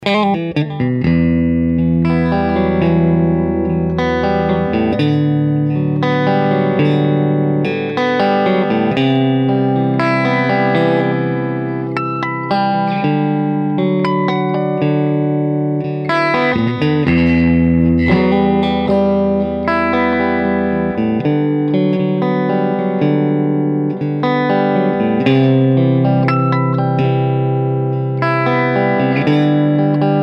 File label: clean strat